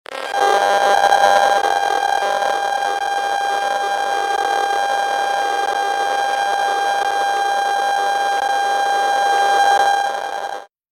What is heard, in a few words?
ps4 wifi